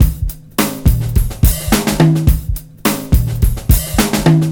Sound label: DRM, Breakbeat; Best Service ProSamples vol.24; AKAI